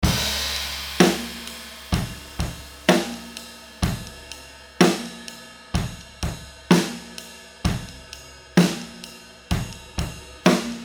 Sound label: lct 640 ts overhead eight; lewitt